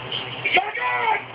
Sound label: Gun; Dumb And Dumber